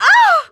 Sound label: Damage Female; gamerip; Car Simulator 2; android